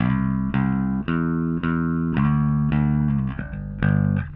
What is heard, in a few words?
Bass, Indie Rock; Session 01 - Brighton; Sonic Foundry (Sony Creative Software); Indie Rock Anthems